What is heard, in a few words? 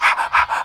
breath LOOP; Voice; ooh ahh